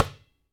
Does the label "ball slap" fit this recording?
Yes